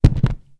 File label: fall; Alien Arena; cybergirl